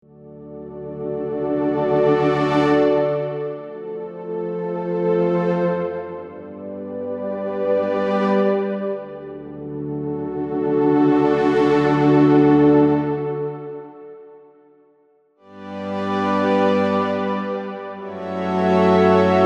presets strings and pads; ps-20

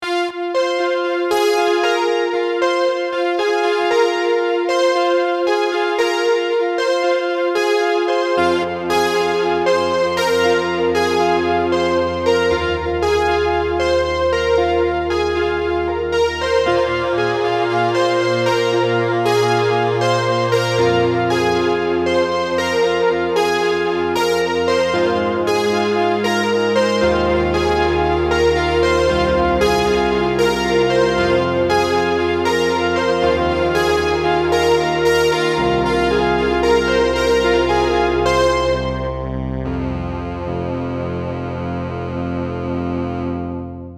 ps3300 poly and pads

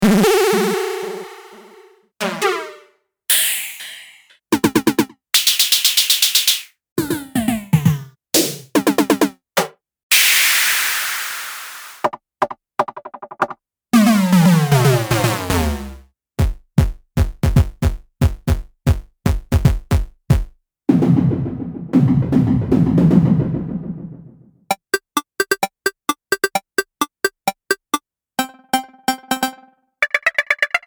synth expander mod percussion

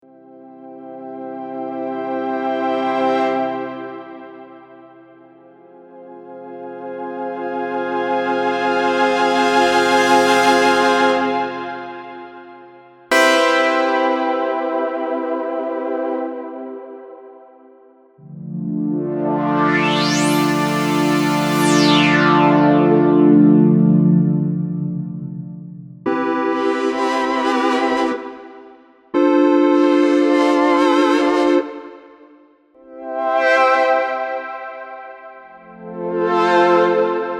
poly and keys; ps-20

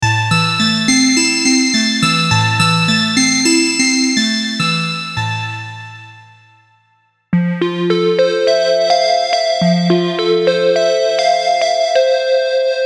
keys and bells; atomika

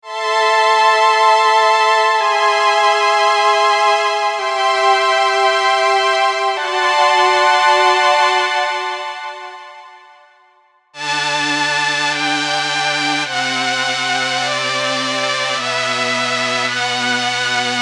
strings and brass; mercury-4

